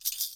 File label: india hatheli soft